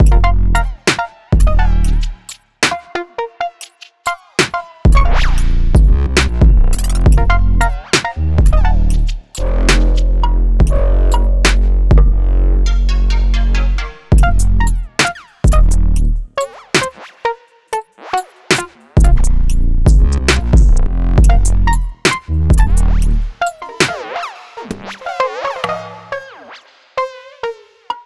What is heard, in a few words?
Frozen beat; ableton live projects; stems